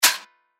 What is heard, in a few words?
FX Hat, cymbals; revealed cymbals vol 1